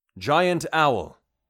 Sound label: giant owl; bestiary; 5etools